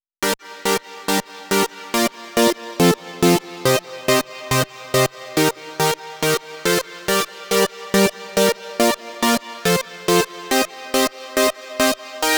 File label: Pitched Synth Offbeat, 140 BPM; Vengeance Samples; Song Kits